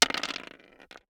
DICE on Hard Wood, Throw and Roll, Standard, Four Dice; Samples; ArticulatedSounds; DICE Sfx Party (Extended)